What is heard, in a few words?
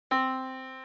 yamaha Disk